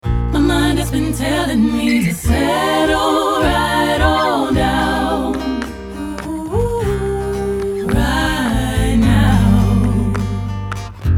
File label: FLAMENCO